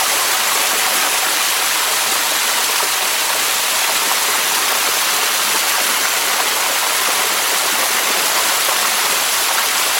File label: water hose; OpenValley